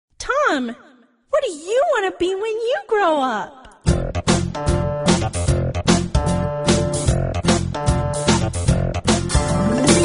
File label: tom